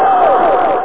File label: MRL adjust; TacOps Demo